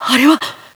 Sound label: jump; svencoop; player sounds; lappland